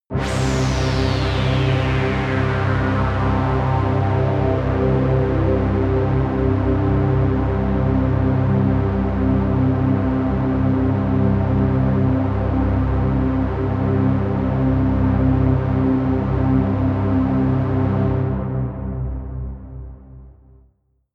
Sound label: pad sweep brass; flaechen